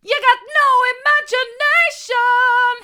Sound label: you've got no imagination hard, vocal